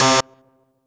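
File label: Rave Synth, Dance Mega Jungle Rave; Best Service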